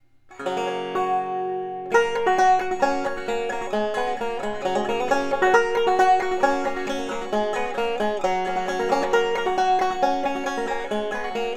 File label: banjo, African Blackwood-topped rim; extra-broad leading edge; Flop Eared Mule